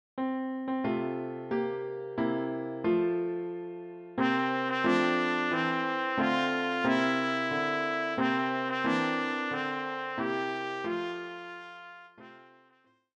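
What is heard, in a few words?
trumpet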